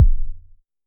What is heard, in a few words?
Voyager Kick, Moog Voyager